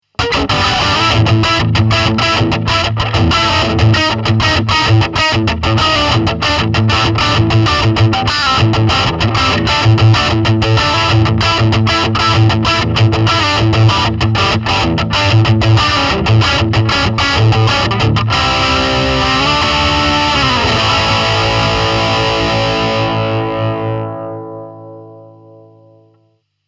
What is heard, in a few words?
Red Channel Lead Tone 1